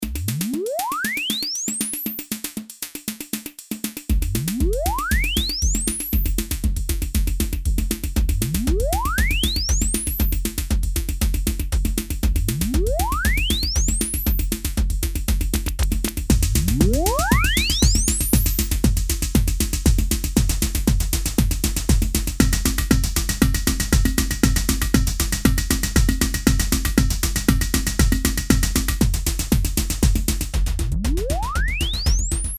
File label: Redrums; analogmonster